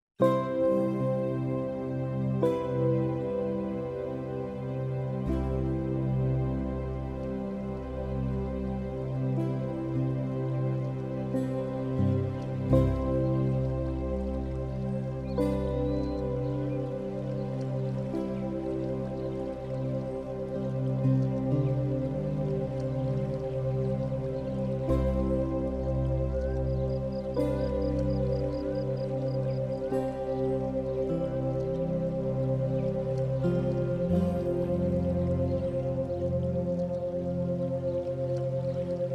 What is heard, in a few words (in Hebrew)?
מוזיקה עם תדר אהבה 528